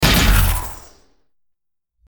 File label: Laser blast